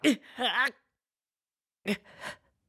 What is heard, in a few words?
Die; Link dying; BOTW; Breath of the Wild